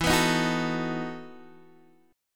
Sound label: E Major 7th Suspended 2nd Suspended 4th E, Guitar, Open D; chord shape